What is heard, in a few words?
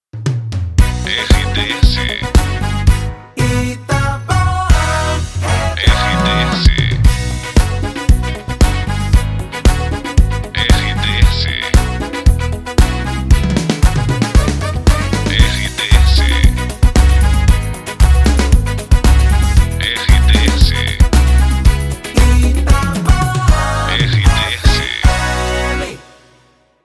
retro; Itapoan